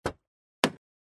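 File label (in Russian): звуки дверей автомобиля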